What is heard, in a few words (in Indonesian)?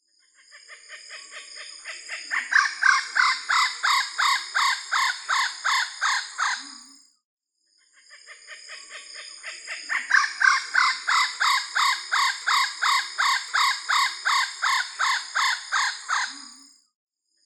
suara kukabura perut merah